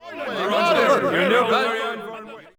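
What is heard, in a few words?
men murmur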